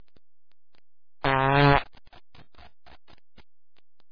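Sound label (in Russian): пукание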